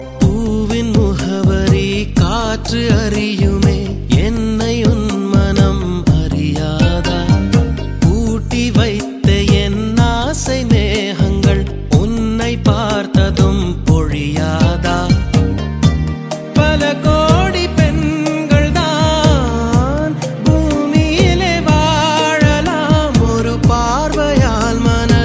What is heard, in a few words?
Tamil Love Ringtone